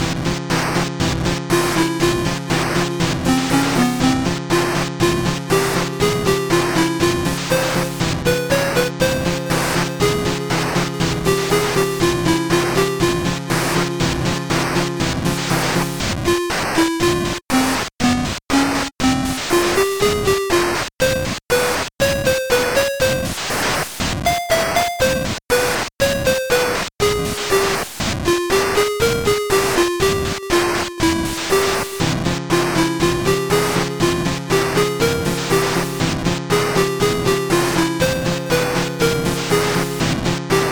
Boss Battles Background Music; dewinTheme

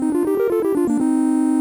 Achievement Unlocked; dewinTheme

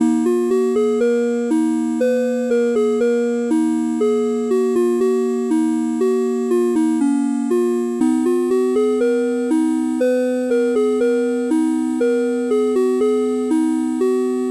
Settings Page Background Music; dewinTheme

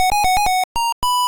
Reward; danielTheBard

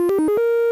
Chat; dewinTheme

